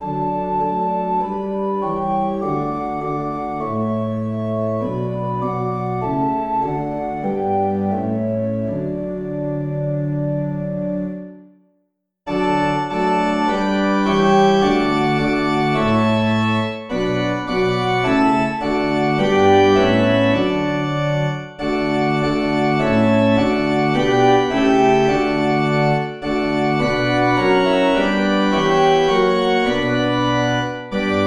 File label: Organ; Christ whose glory fills the skies; Ratisbon; 3 Verses